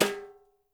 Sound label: BRUSH TIMB; Spectrasonics; Liquid Grooves 1 & 2; BR.TIMBALES